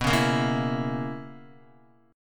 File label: B Major 7th Suspended 2nd Suspended 4th B down Guitar; BM7sus2sus4; strummed; JGuitar